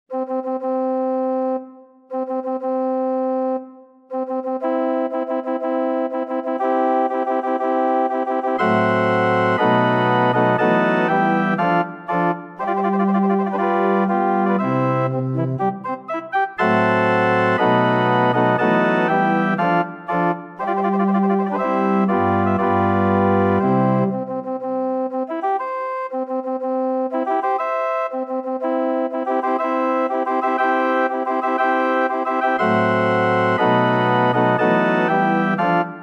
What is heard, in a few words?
organ